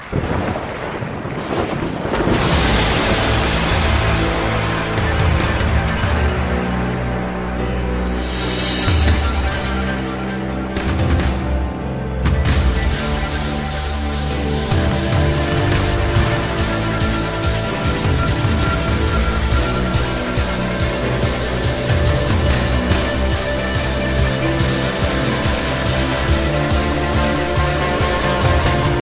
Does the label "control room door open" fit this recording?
No